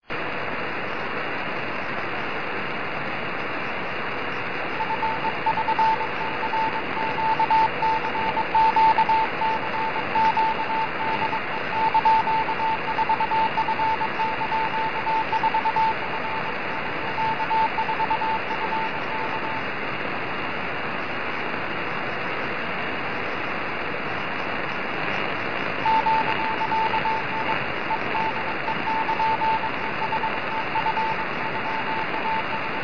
on 6m cw; ya4f

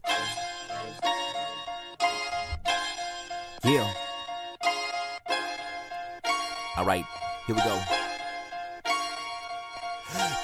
flash rap

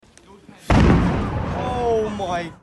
wwii bomb detonated; in kingston

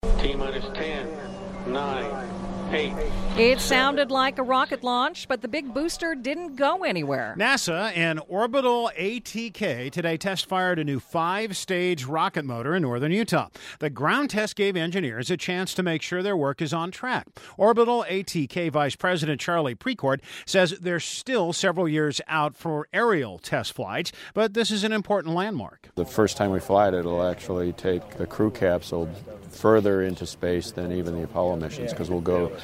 rattle northern utah with rocket booster test; nasa and orbital atk

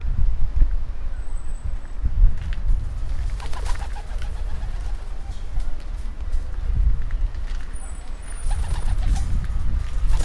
fly away pigeons

